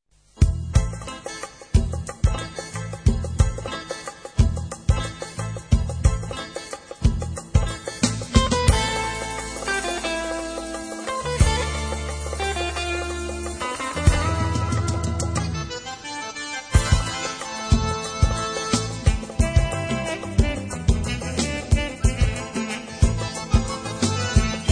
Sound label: Instrumental